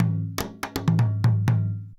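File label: 120 DEEP DR, drum; Best Service Advanced Media Trax Modern Composer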